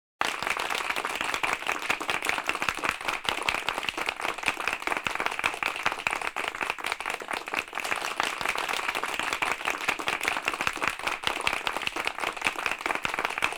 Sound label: Loud group applauding